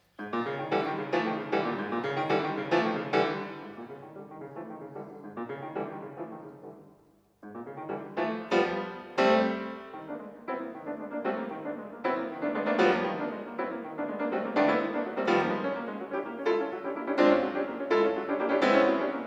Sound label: Argentinian Dance